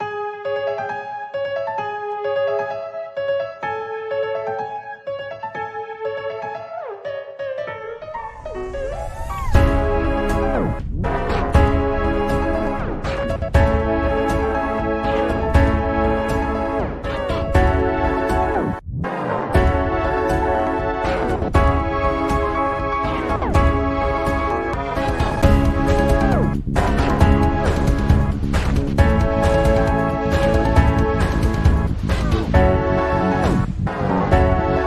Instrumental; Friday Night Funkin Hit Single

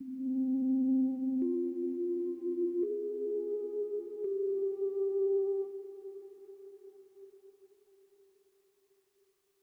Overlay Tone; Flytrap

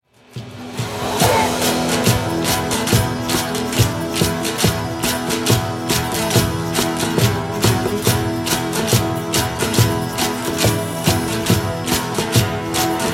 medieval tavern; Shadow Legend VR

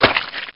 pod die

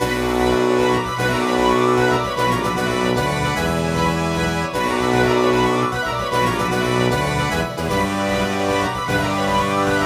Star Chords

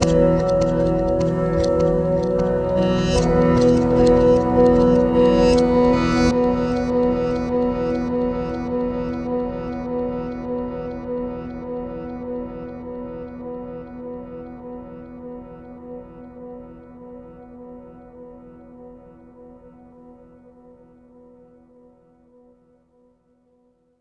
Overlay Tone; Act2 Anchor

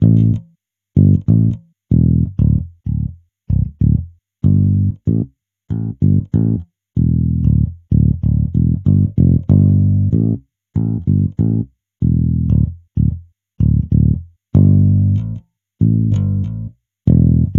Elec bass

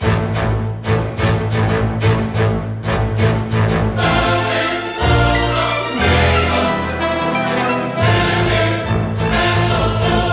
Koala (Off Drum); Deeeer Simulator

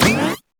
Grappler pickup; LittleBigPlanet 2; PS3

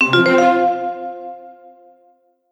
Interface Clear; Piczle Cross Adventure

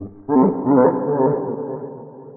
Laugh; Five Nights at Ronald's

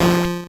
core squish

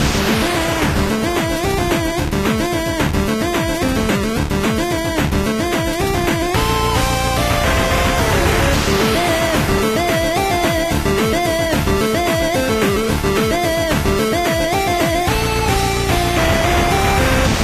Aggressive; Super Metboy

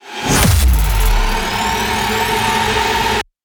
combat 140bpm; combat UAC 03 140bpm wip GLORY 04

